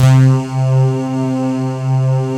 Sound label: SIGH C3; Big Fish Audio; Synth City; COMPOSITE